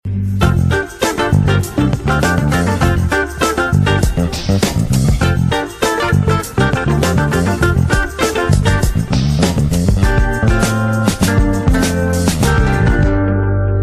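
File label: guitar; Jazz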